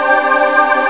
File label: choir; sus2